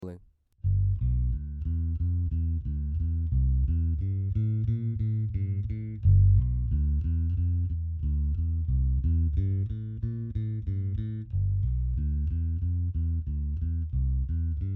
Senn E602ii Bass 1in from amp grill 3in from center of cone